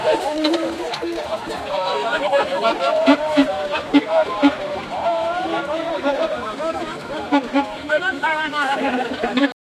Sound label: create an ambient sound e, bazaar